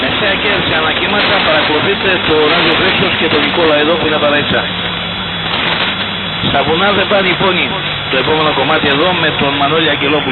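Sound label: 1639 khz, pirate radio; Kristos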